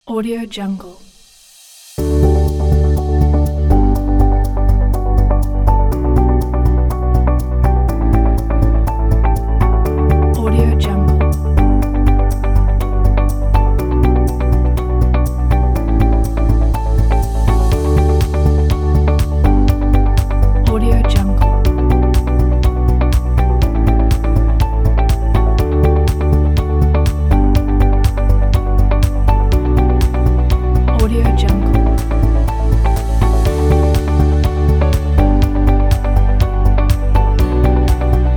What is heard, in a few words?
corporate; corporate pack vol 23; duoflame